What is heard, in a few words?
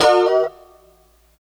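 F 4 B mono slide L, guitars; Spectrasonics; Hans Zimmer Guitars VOL-1; 90's Sample CDs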